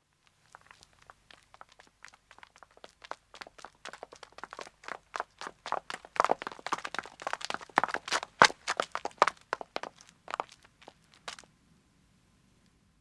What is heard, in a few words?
Footstep road run, 2 women approach and stop; Part of Footsteps (Sound Effects:Human); BBC